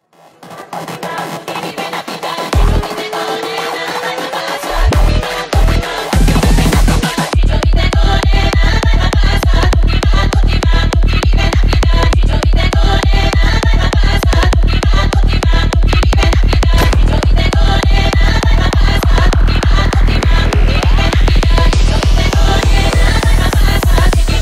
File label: balkan 200bpm remix